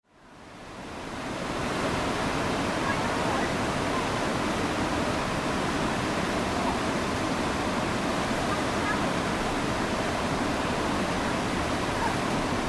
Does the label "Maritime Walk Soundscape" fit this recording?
Yes